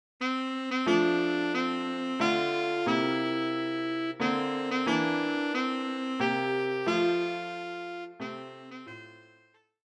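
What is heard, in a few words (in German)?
Altsaxophon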